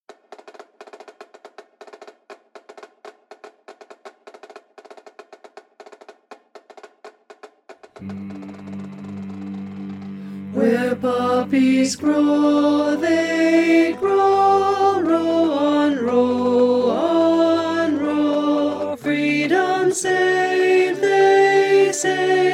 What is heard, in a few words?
Tenor